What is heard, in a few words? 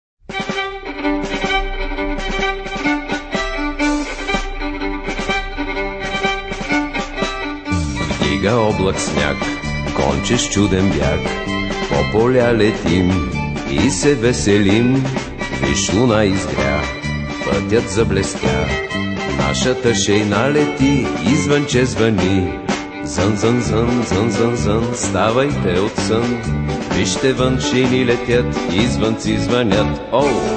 Koledni Pesni Jingle Bells; Bulgarski